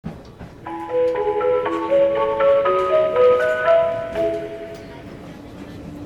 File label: chime; toyamachihodentetsu; unazuki